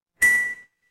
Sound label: feedback confirm